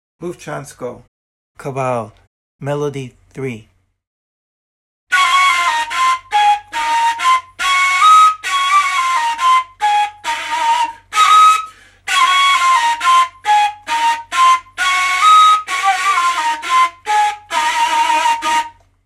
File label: kaval; Bufcansko